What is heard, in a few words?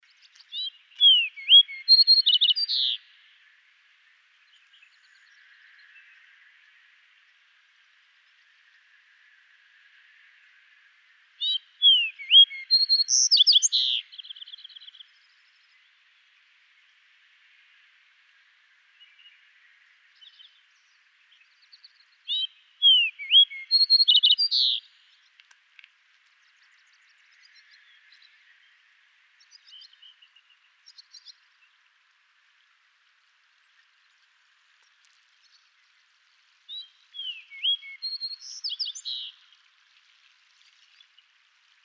Passerella iliaca song